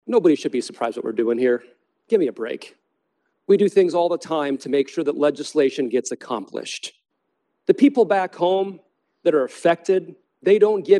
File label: beep